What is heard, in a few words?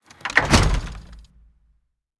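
wood single light close, door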